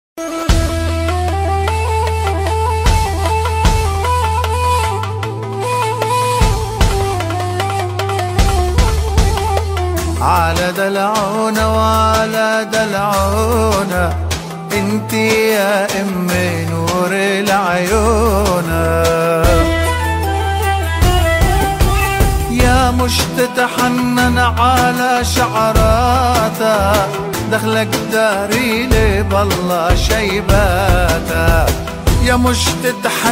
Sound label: arabic ringtone